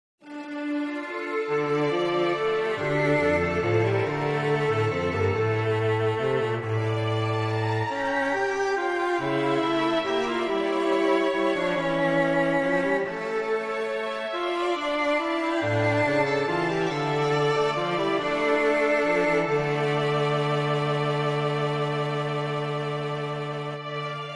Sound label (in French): petit trio en re pour cordes